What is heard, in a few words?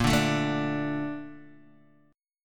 Bb Major 7th, Bb, down, Guitar, DADGAD